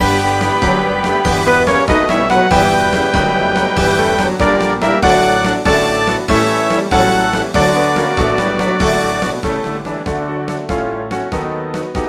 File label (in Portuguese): hino orquestra sinfonica coro piano; hino da justica eleitoral